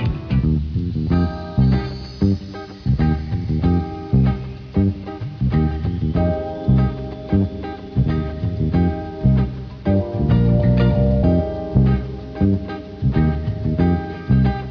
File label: smooth jazz radio